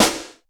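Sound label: GATE SNARE; Crypton - DrumBase5000; GATE KITS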